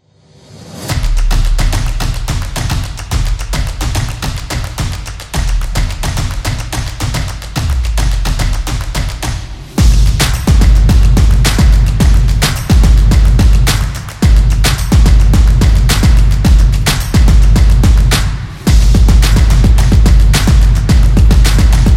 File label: Percussion Rhythmic